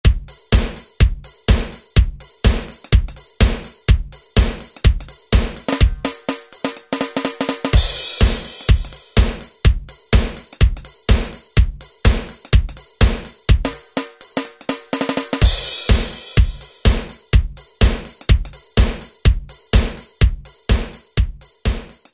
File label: Alesis SR-16 User Patterns